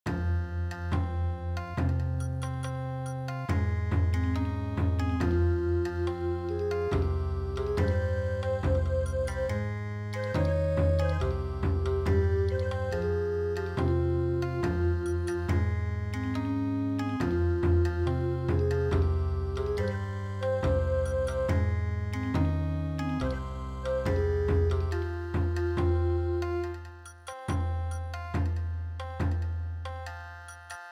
Bon Odori, traditional

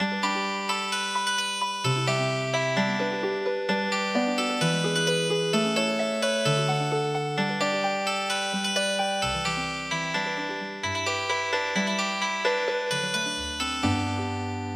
Healing Harp

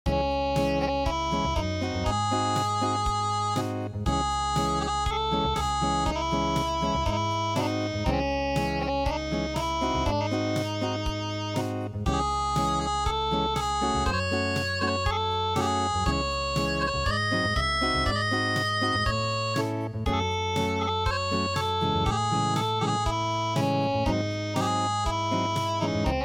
tomorrow Acoustic

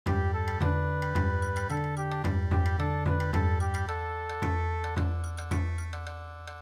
Bon Odori

